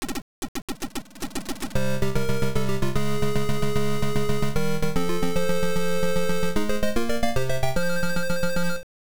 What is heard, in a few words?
Victory Fanfare; penguin adventure msx